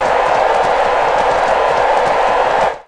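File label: strong wind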